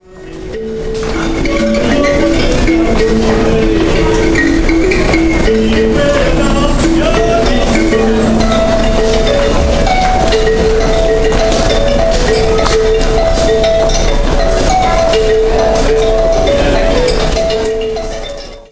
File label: kalimba; ttc bloor